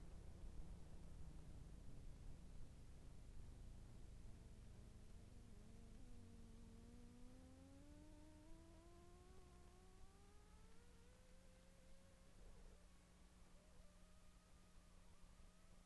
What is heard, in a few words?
interference condensor phantom ac